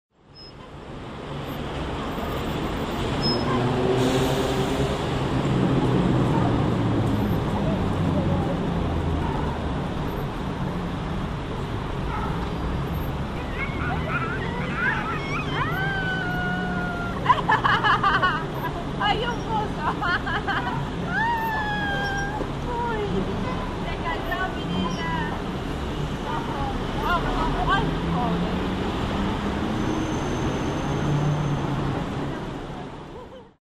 parques; mariano moreno

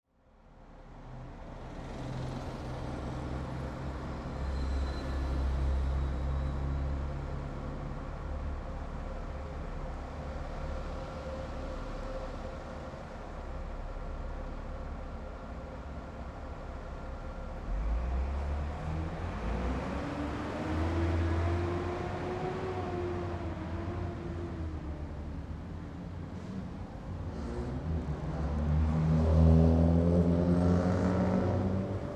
trenes puente; caba; tba; sobre luis m campos y ravignani

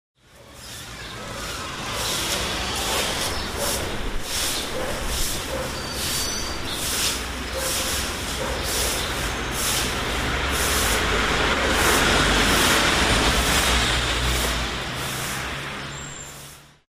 calles mujer barriendo hojas, rosario; callao y mendoza

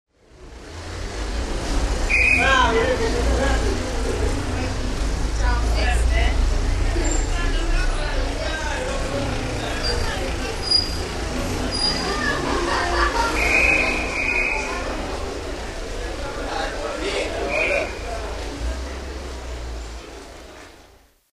calles peatonal; cordoba y entre rios

